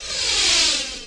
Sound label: IND; Zero G; Man Machine